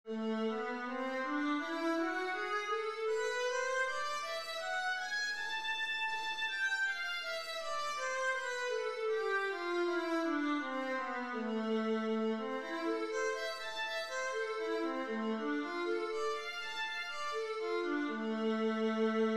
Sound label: viola a minor, scales